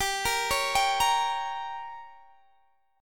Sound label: G Diminished, Guitar Standard; arpeggiated; JGuitar